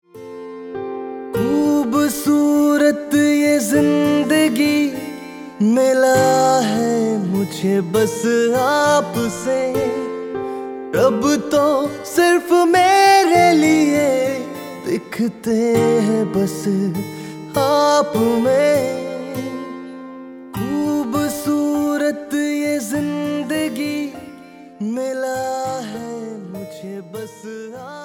THANK YOU HINDI MALE KIDS TO PARENTS

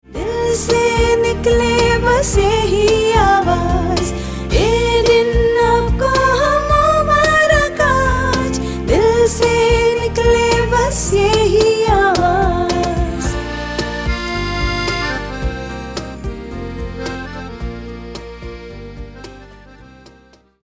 HAPPY BIRTHDAY TUNE DAUGHTER TO DAD HINDI FEMALE

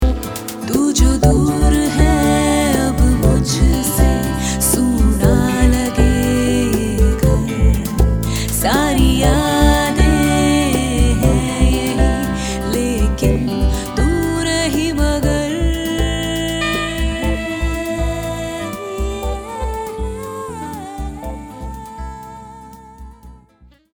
Hindi female

